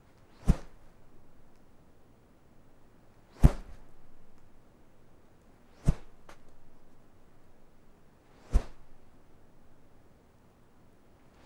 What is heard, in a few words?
Whooshes Sngl Rope Sof